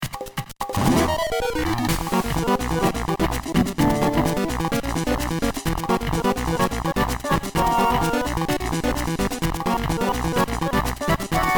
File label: Mini-Game Theme